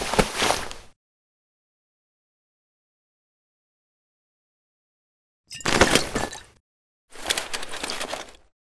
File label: conductor foley; commander; baker; Titanfall 2